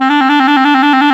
WND TRILL, Clarinet FX

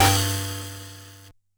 Bent Cymbal; glitch; Bent Hits

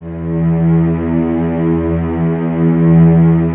E 2, String Ensembles Cellos; Miroslav Vitous

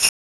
Harp; Heracles no Eikou IV - Kamigami kara no Okurimono

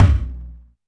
DM5 Kick, Alesis DM5 drum machine

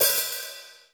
HI EDGE DRUM; 90 sSampleCDs; Crypton - DrumBase5000

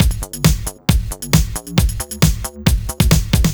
Fill, 135bpm, Nu Dance Electro; Zero-G; Soundclash; Combi Loops; All Square

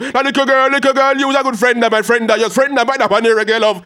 HAL RAGGAM, RAGGA-MUFF A maj

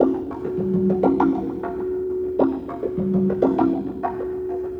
Ambi Groove

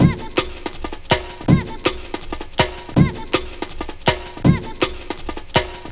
162, breaks; M8

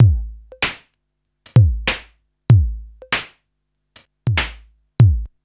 BEAT, Hip Hop R n B; USB Soundscan vol.19; AKAI

